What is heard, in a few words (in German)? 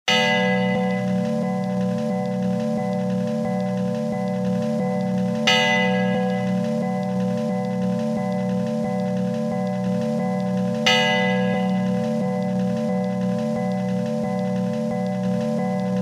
Glockenturm Rave 88BPM